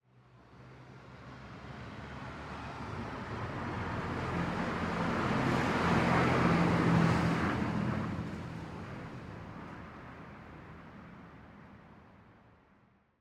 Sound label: car medium pass by blurry, Urban; aXLsound